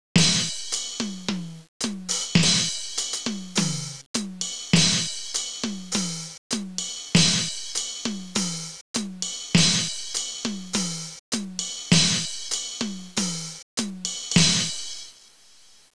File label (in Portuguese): bateria; Revista do CD-ROM: Edição Extra 1